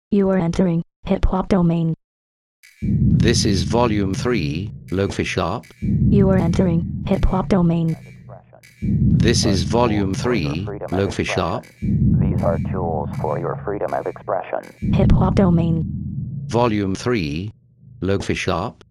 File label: LoFi intro; Hiphop domain